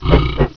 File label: attack; unreal; skaarj